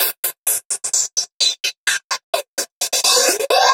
House loops 128BPM; Vengeance Samples

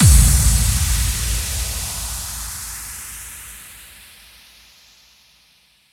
Bassdrums FX, FX Kicks; Vengeance Samples

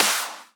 138 BPM Clap; Drums & Perc; Bminor

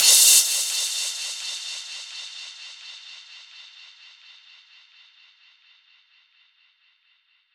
FX Downlifter

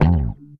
bass F#, vocal house; PS-28 Vocal House Samples; basses

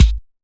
Kick; Dubstep Sample Pack; BassDrums

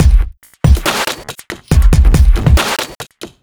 drum loop, Dubstep; Dubstep Sample Pack

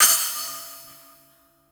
Percussion

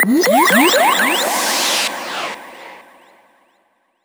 FX Mix, 128 BPM; Vengeance Samples; Vocal-Hook Kits; Song Kit; Freaky Boys

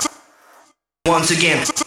House Loops, Vocal Loops House 128BPM

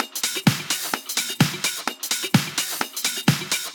House Loop, 128 BPM; Vengeance Samples

